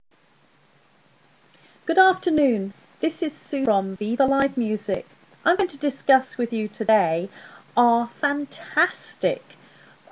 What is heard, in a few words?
brass quintet for excellent seasonal atmosphere outside your venue